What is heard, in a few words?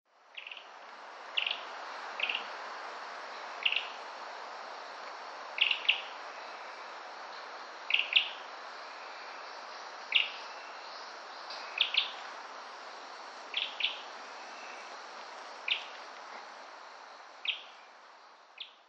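akahige, Ryukyu Robin; kunigami; koe; oshiro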